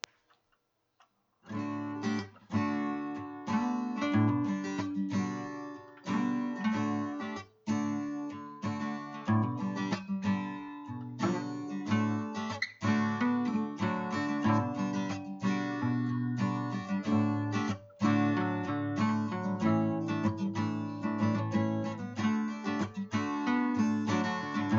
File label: What a Friend We Have in Jesus guitar instrumental